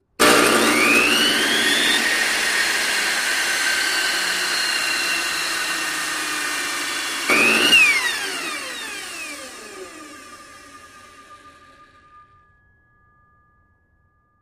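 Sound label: High Speed Large Table, saw; High Speed Large Table Saw, X5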